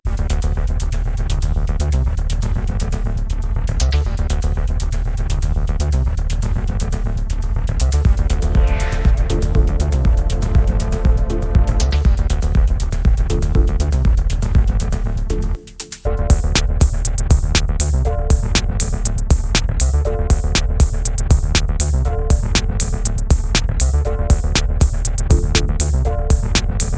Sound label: Dub Climb up 120 BPM, bass drop; Dub Tales